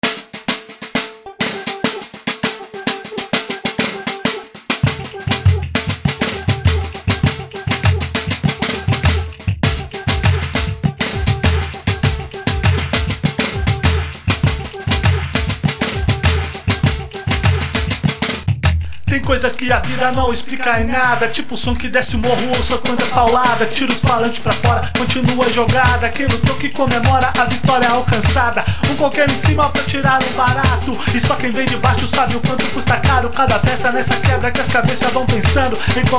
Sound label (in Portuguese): samba de irmão